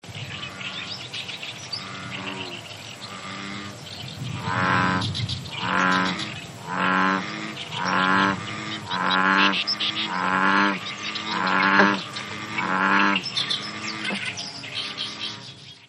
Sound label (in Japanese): usigaeru